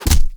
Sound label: bullet hit player